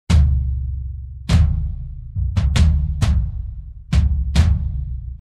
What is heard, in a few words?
drums loop